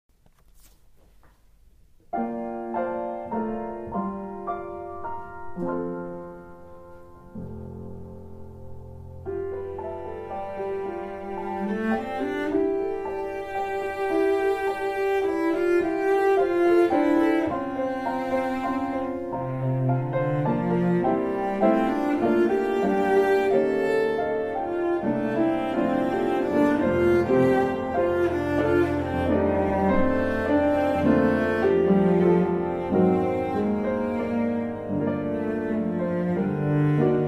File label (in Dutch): Intermezzo voor cello